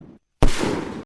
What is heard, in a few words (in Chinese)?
爆炸; 炫彩烟花; Scratch入门篇